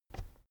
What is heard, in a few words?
keyboard external space push soft